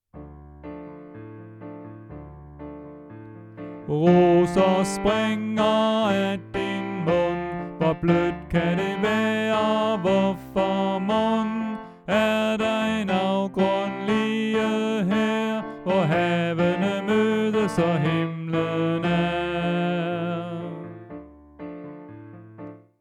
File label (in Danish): Tenor; oevefiler